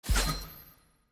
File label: environment mob level up